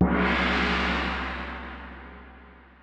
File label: gong, Roland MC-909